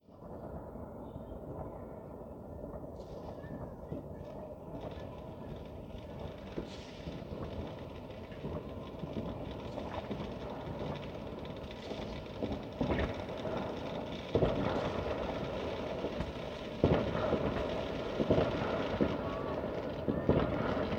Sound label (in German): silvester feuerwerk; entspannen einschlafen geraeusche